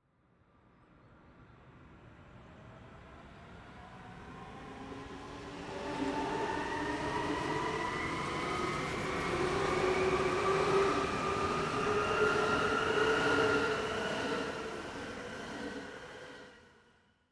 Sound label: train city accelerate depart